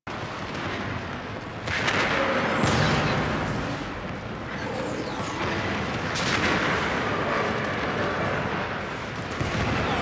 Exterior Battle Amb A; Warframe; The New War

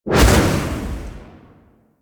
Operator Focus Blast Fireball Shot; Warframe; Operators; Powers; Attack

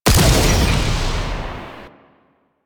Rifle Alt Fire Shot; Weapons; Warframe; Corpus; Crp Ruban Rifle

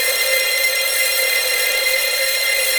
Owl Recon Aim Connect; Duviri

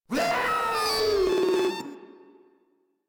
Proto Infested Runner Player Spotted; Warframe

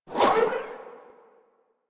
Fire Spear Slam Cast; Warframe; Warframes; Nezha

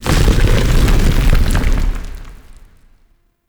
Boulder Start, traps; Objects; Champion Crypt

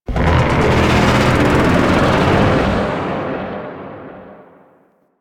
Void Dragon Vocal Idle; Enemies; Vocals; Warframe; Duviri

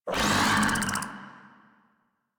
Desert Skate Vocal Attack E; Warframe

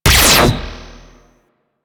Laser Rifle Fire; Crp Laser Rifle

